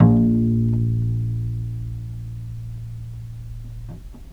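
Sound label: VIOLE PIZZICATO A#2, Historical Instruments; USB Soundscan vol.23; AKAI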